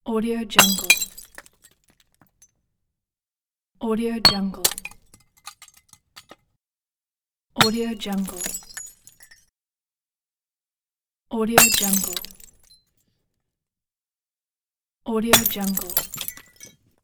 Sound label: glasses